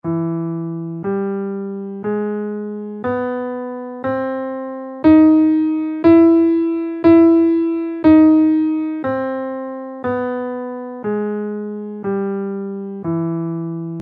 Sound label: augmented scale in E